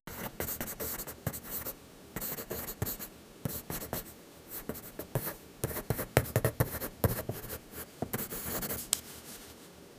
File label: pen writing in a paper